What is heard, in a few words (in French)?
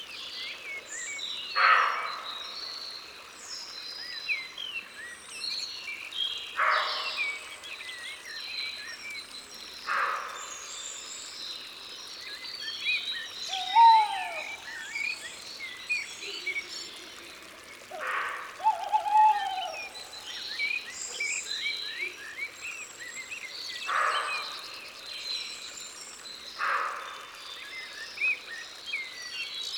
Chevreuil, Concerts naturels de l'Isere; Studio Les Trois Becs